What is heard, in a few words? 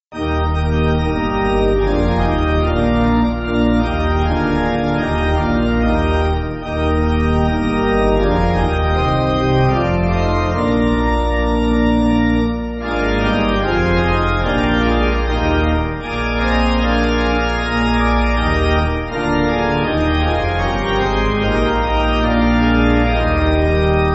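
Pipe Organ, church backing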